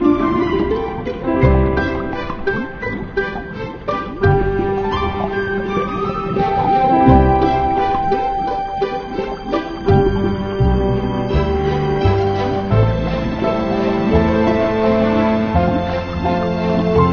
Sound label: Bubbles; Nemos Bubbles; Pixar Pals; Leapster Explorer